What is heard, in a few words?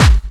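snare drum bass drum, Dance Mega Drums; Best Service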